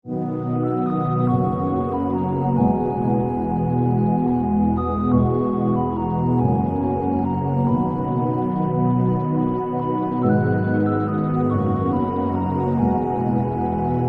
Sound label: Instrumental Version